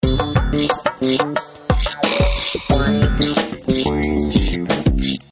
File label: funky ringtones transition